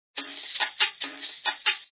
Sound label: maracas; maya miguel global groovin